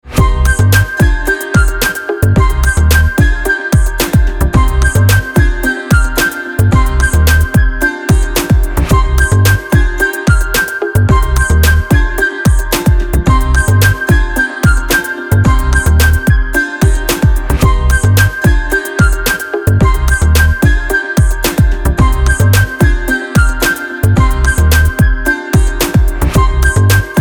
background hip hop music funny dance instrumental for short video